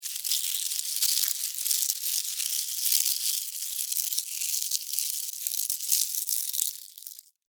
Insect Move Object, Insect, Movement, Object, Drag, Bug; Animals:Insects; Leaf, Foliage